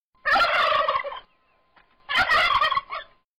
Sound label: turkey